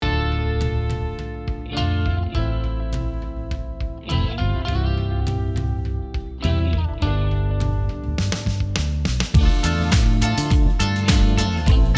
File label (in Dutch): op stap INSTRUMENTAAL